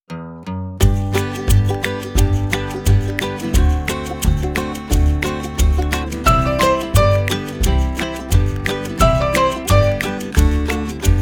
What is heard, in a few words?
English Instrumental Song; everyone counts song; 2020census; pre-k